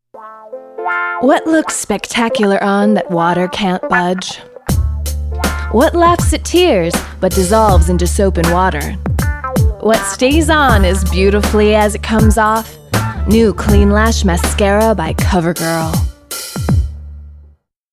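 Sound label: rap; Clean Lash Mascara Voice Over; Internet Session, Los Angeles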